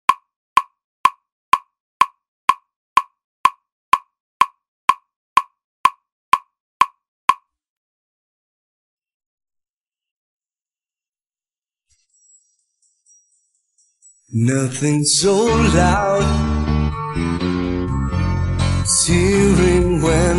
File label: Drumless